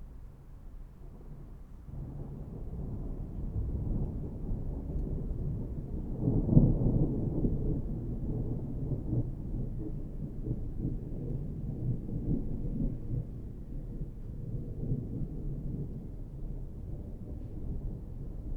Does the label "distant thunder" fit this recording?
Yes